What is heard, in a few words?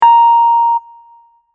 A3 sharp, Keyboard notes